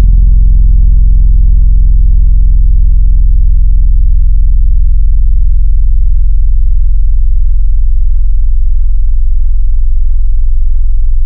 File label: Elec Piano; Synthetic's Serum Collection